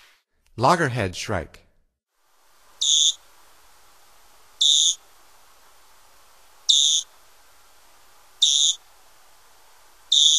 Loggerhead Shrike, Songs of Birds of North America; Stokes